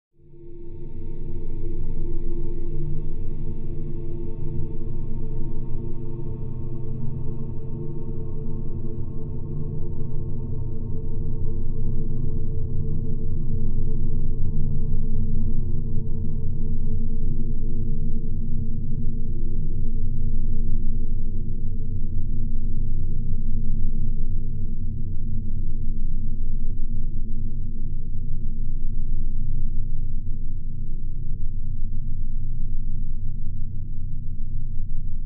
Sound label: Bed Haunting Drone